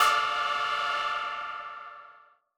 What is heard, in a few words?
M Dynamic Tech D4; KG MegaSaur